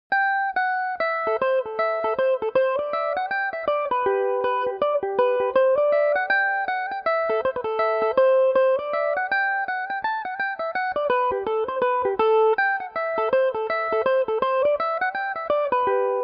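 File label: A dorian, irish mandolin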